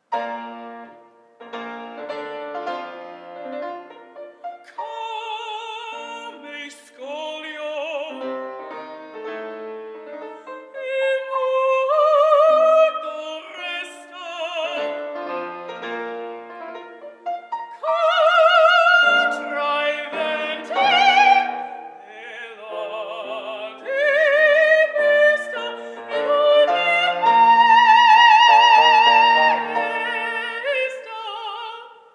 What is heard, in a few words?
[F] [opera][italian][singing]; Come Scoglio from Cosi fan tutte - Mozart